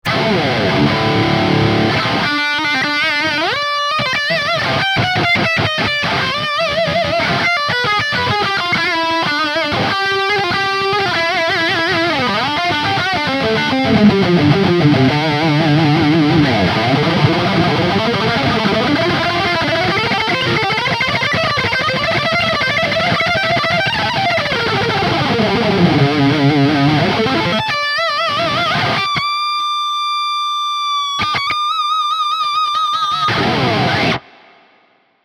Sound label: lead line